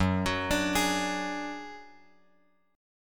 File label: F sharp Suspended 2nd Sharp 5th; F#sus2#5 Chord {2 X 4 X 3 4} arpeggiated